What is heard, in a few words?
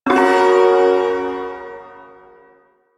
Shawzin Yo Chord A; Warframe; Duviri Ersatz Shawzin